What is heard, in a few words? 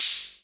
rhma hh, Whippany Rhythm Master; drum machines; 1970s